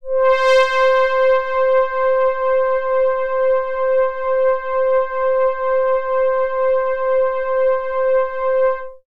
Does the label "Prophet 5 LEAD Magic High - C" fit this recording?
Yes